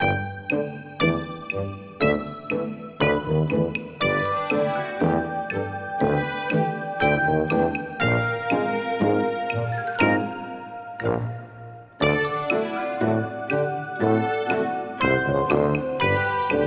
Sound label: Drunk Ambient